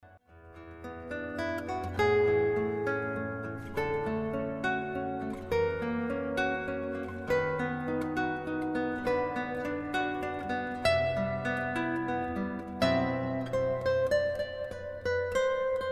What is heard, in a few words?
Classical Guitarist; Lansing